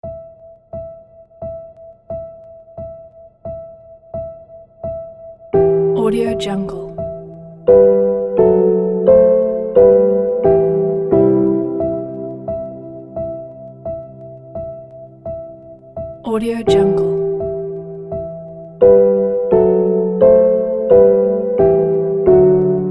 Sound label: calm quiet piano